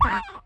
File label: kobo dead